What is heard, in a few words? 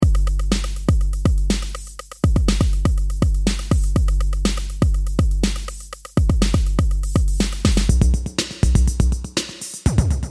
ambient electro